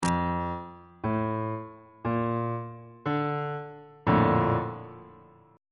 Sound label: b flat major 7th 2nd inversion on bass clef